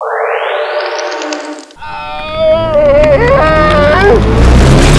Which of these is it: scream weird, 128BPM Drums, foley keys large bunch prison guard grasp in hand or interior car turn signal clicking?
scream weird